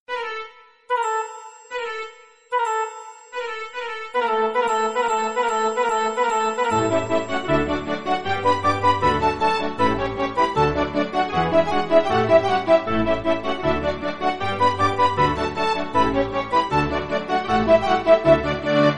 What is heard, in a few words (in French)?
sonnerie de rythme classique